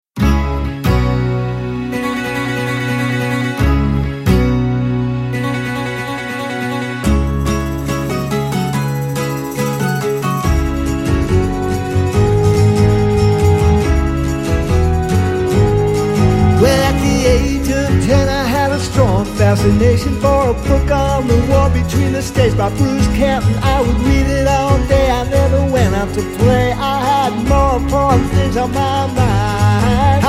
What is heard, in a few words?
Acoustic Version